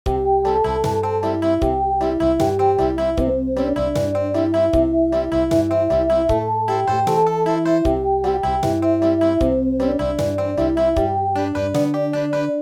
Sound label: Country, midi traditional